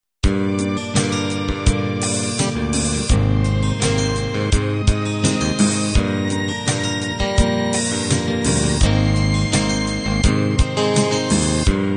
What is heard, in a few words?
guitar ballad